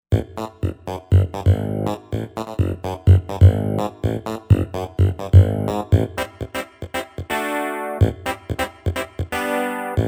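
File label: Piano Electro Clav, synth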